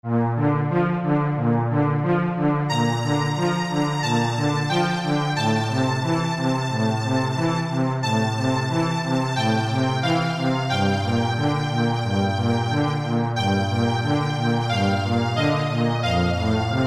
duo violon violoncelle; chauveau bruno petite meurphy

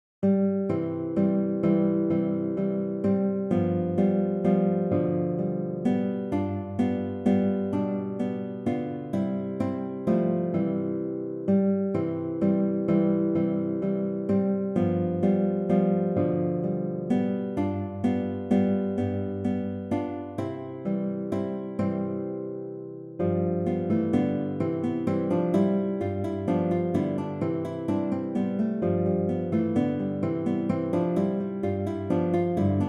danse tyrolienne

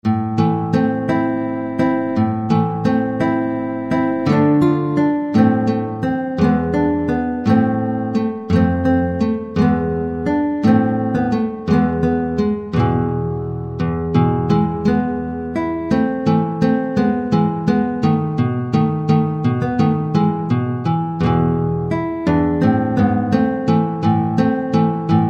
guitare solo

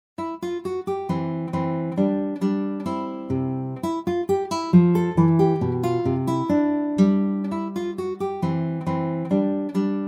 rondo majeur

